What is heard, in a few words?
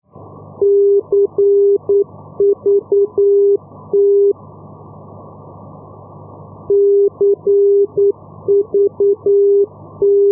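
ndb, non-directional beacon; madrid-cuatro vientos cvt-285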